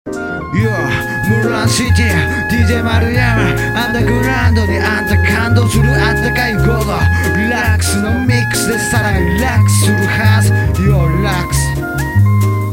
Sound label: groove